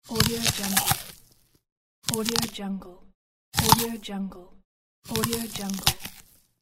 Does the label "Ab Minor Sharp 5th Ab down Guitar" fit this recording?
No